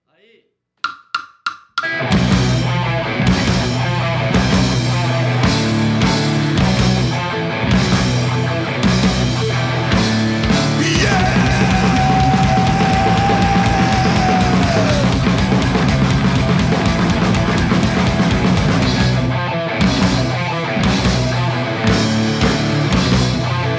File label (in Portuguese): punk ao vivo